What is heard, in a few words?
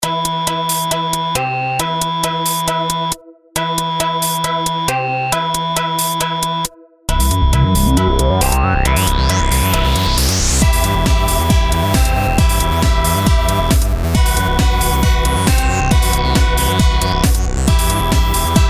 Workout Montage Music; Adventure On! - Various Songs